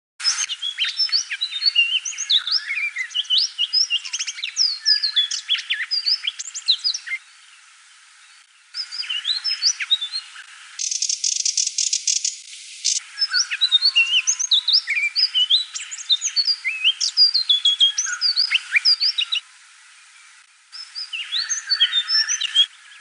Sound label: speckled Warbler; scrubwrens gerygones thornbills pardalotes and allied birds